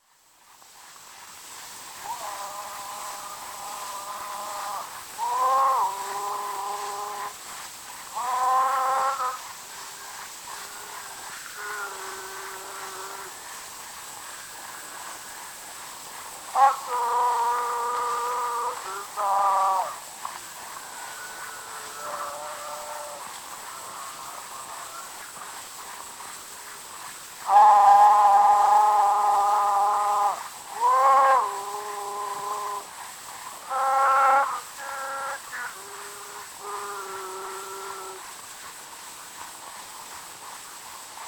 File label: Zulu Recordings; Father Franz Mayr; Ihubo lempi